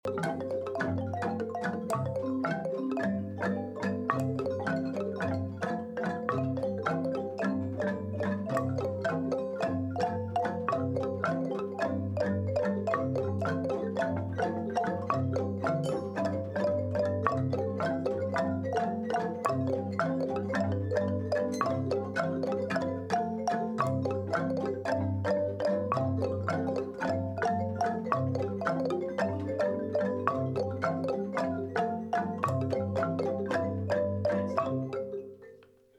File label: Mbira Jam